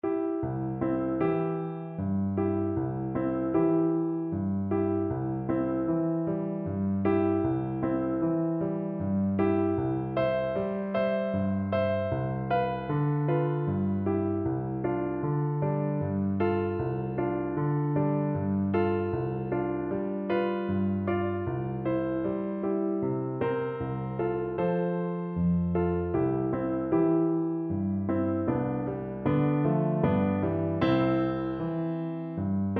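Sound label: vibraphone, Percussion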